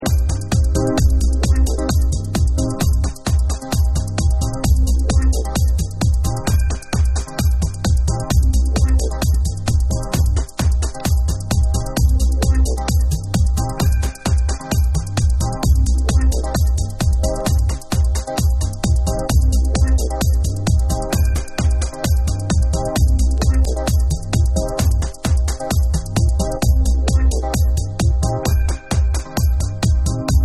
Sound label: Dub Mix